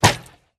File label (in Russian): vystrel arbaleta; minecraft